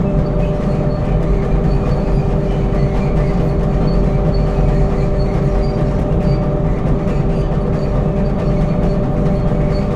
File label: Drone Bass Squeaky Cog Close, Cement Factory; Double Trouble Audio